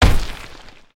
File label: effect tu